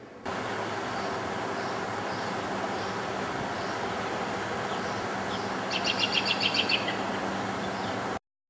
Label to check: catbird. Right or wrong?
Right